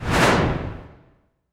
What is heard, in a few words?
cinematic impact wipe